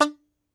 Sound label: T. BANJ D4, Tenor Banjo; Plucked Strings; E-MU Producer Series Vol. 7 – Old World Instruments